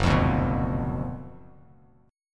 Gb Major 7th Suspended 4th Sharp 5th, Bass Standard; GbM7sus4#5 strummed; JGuitar